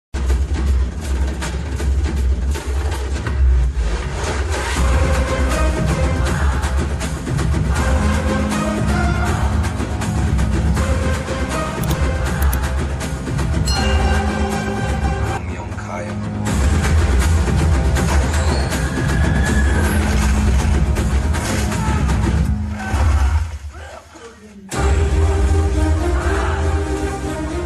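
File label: entry background music ringtone; raviteja; eagle movie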